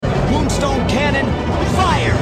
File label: cannon